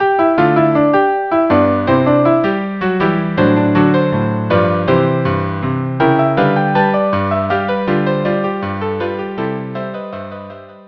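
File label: Rag string quartet; Pigeon Wing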